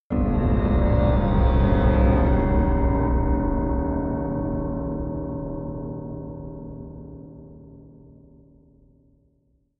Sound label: horror bells, drones